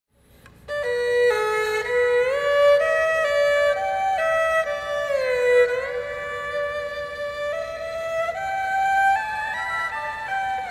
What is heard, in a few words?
fiddle; frottees